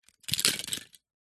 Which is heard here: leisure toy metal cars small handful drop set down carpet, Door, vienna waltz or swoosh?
leisure toy metal cars small handful drop set down carpet